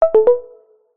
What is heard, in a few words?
notification tone soft subtle